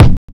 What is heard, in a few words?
kick, Drum and Bass; EZ Rollers; Kick Set 3; Instrument Samples